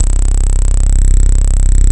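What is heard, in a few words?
D50 bass, left; East West - Phat & Phunky CD2; 90's Sample CDs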